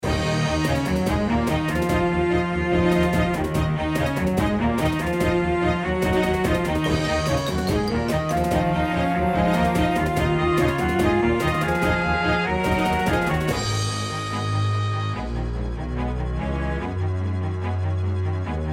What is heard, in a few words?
Victory, Music; Age of Empires II - The Age of Kings (PC)